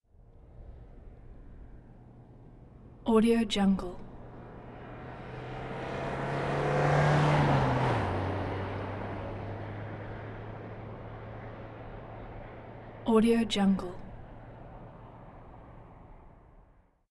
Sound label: volkswagen fox car 4 cylinder